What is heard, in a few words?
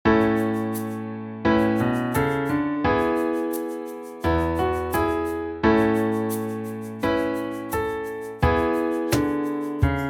Drum Piano Cello Violine2 Violine1